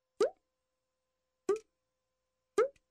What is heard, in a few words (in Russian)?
kapli krupno